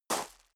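step dress boots gravel medium slide short, footsteps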